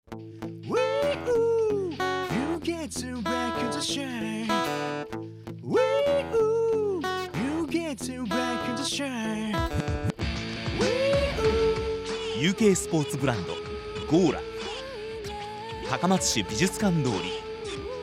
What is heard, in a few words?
acoustic ver.